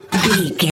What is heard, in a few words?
metal lid movement impact